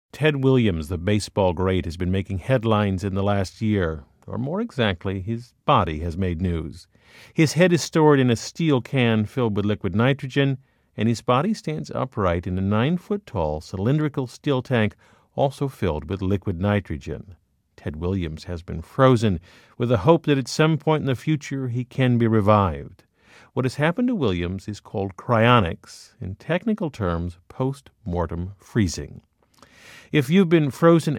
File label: cry